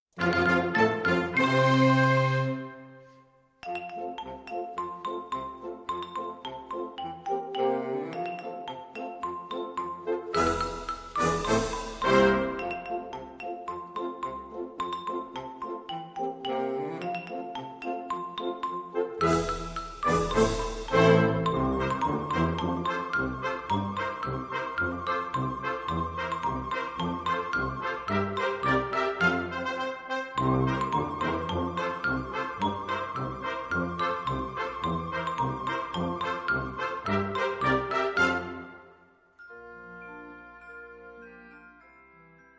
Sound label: three little pieces for percussion